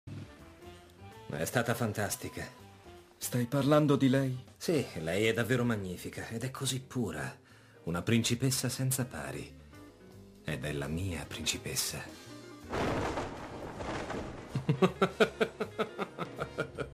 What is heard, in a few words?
Italian Laugh